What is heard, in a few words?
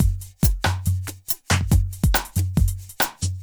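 industrial 140 kit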